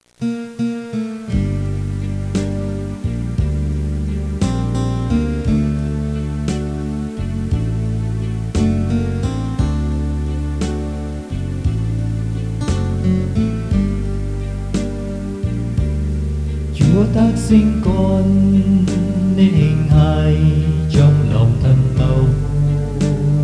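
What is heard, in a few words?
soprano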